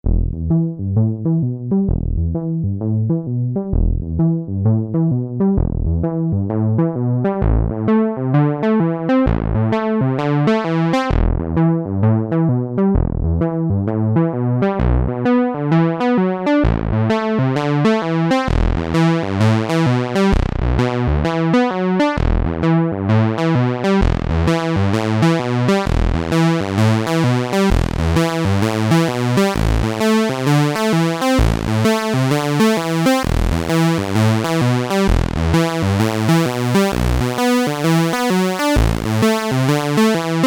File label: Moog Mother 32 Pulse ARP